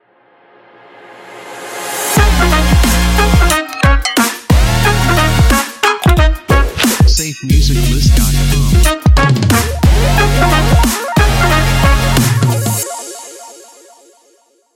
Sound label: Future Funk Audio Logo; SafeMusicList